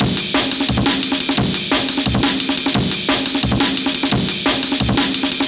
Amen EQ 175, Classic Remastered Jungle Breaks

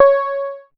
C#, PIANO; Big Fish Audio; Synclav FM

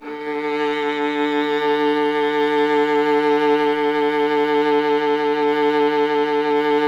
viola D; Miroslav Vitous; Solo Instruments VOL-1

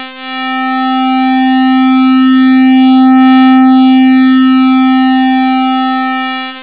2x square, raw osc pads; Zero-G Ambient 1

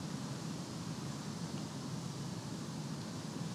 Ambi Loop, outdoor samples Ambience Loops

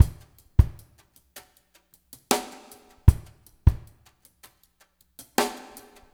ICE DRUMS, Liquid Grooves; Spectrasonics; Liquid Grooves 1 & 2

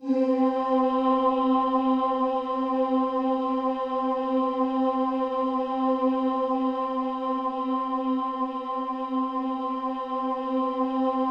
C4, Synth Leads

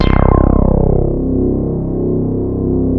SH 1000 REZO, BASSES

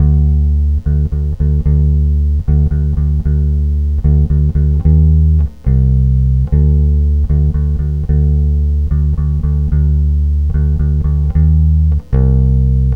Bass D; Zero-G Kocktail Kollection; Construction Kitz (Lounge); Volcano at Sunrise 074

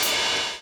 DOWN, DOWN KIT 78

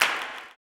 CLAP, blues & soul; ILIO; Vocal Planet

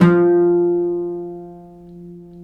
LUTH 2 F3, Arabian Traditions; USB Soundscan vol.43; AKAI

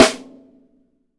MAPLE SNARE

